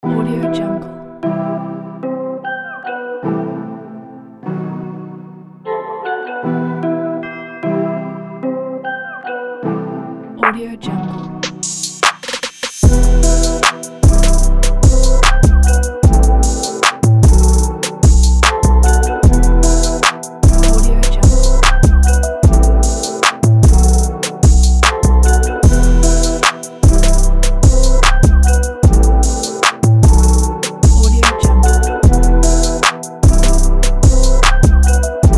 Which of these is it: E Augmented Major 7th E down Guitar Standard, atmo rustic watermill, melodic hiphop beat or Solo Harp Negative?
melodic hiphop beat